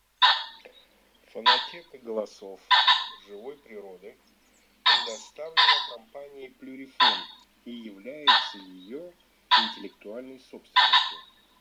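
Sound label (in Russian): Фазан петух Fagiano M, chip mini 12 для манка plurifon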